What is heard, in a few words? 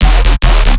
bass hit wook terror E; Virtual Riot